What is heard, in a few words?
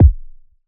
fat bass drum sample c sharp key; kick drum samples